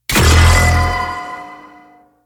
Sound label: ninja sword